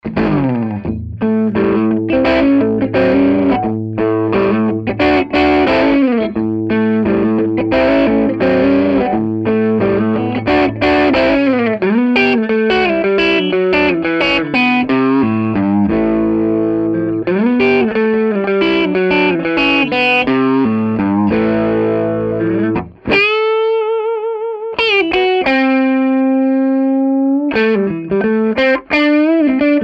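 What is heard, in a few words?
crunch neck bridge, guitar pickups; schwarzbach; HB59-CreamGen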